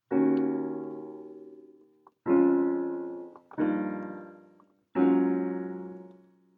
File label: 2 5 progression short